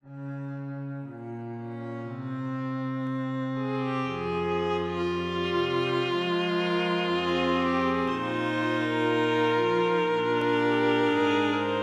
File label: low fantasy; The Voices of Tyr: Low Fantasy; A downtrodden string quartet variation of the Voices of Tyr leitmotif